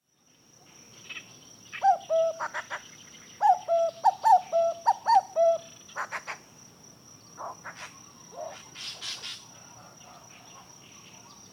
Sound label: kakko alert